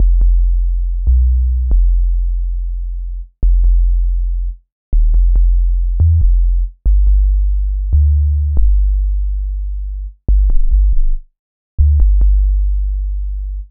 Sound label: Bass Line; Eclectic Electronica